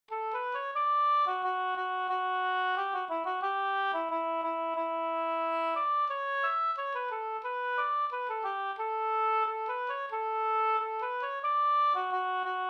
brudmarsch; fr Telemark

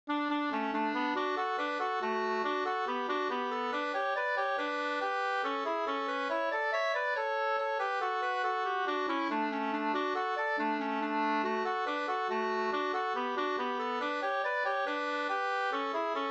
mazurka; e Nils Gustav Larsson Grinda Sd Flen276 2st

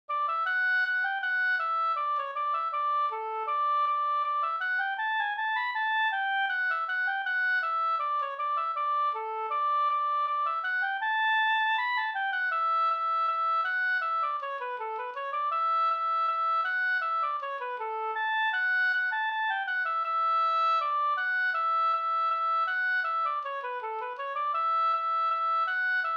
skanklat brudskalslat; Floda Sd

